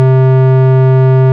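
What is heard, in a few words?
ORG, Farfisa organ; Roland LCDP09 Keys of the 60s and 70s 1; Farfisa Clar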